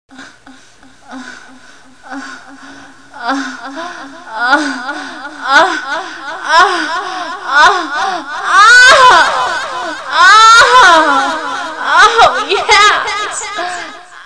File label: ORGASM